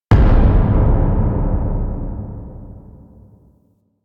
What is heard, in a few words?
Foley Bodyfall; Drifter